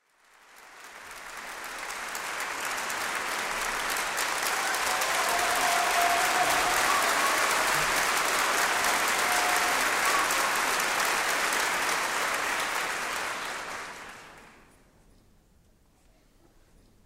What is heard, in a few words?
applause